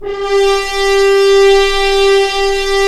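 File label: french horns, swells; Roland LCDP06 Brass Sections; F.Horns FX+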